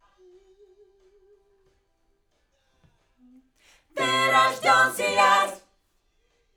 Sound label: Backs AKG